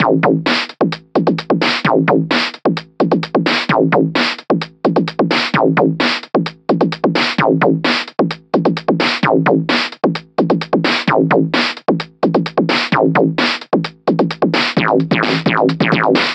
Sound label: BoomBox loop